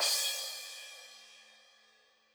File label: Crash, Drumkits